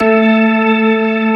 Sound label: P-ORG A3 L, POWER ORGAN